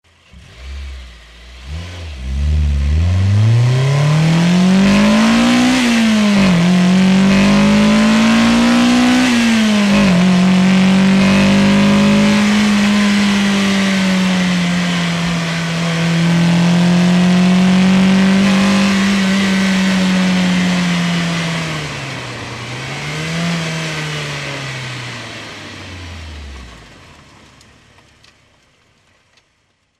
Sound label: 92 Honda Civic